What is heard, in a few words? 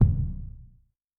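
Bass Drum, Kick, Yamaha DTXpress-II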